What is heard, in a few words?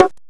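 low bongo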